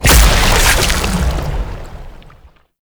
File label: Infested Lich Larva Tendrils End Burst; Warframe; Abilities; Zeke